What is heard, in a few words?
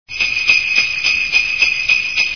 jingle bell